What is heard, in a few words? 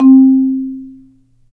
Marimba, -; Roland LCDP11; Africa VOL-1; Af.Marimbas